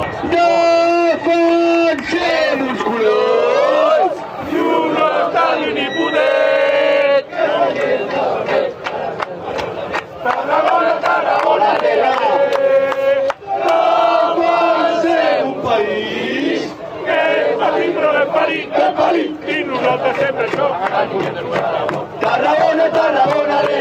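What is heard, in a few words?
fanchants, football chants; defensem uns colors; Trueno Verde=Green Thunder=Club nickname. San Miguel=Name of the club and the place that c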